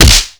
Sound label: bail slap; skater; Tony Hawk's Project 8; XBOX